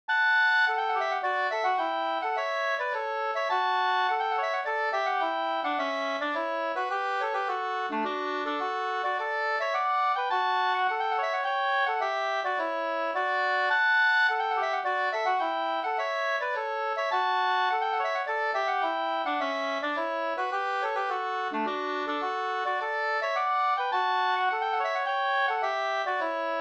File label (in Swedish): polska